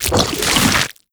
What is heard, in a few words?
open slow, zombie blind jaws; Half Life Alyx; creatures